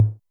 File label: tom, Zoom MRT-3B